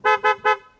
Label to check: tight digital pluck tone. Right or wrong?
Wrong